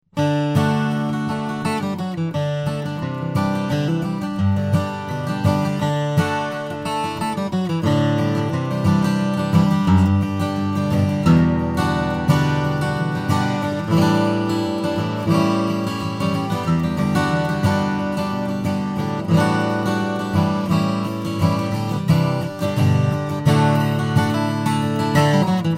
eichelbaum grand auditorium 8 - string, steel-string flattop guitar; seim